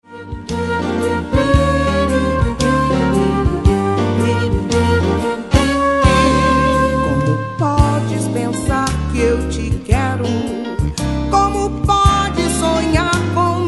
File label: Blues